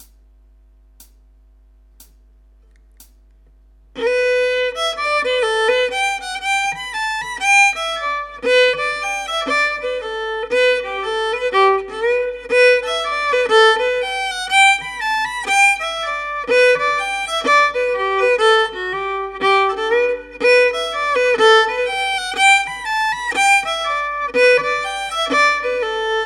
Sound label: controversial, solo fiddle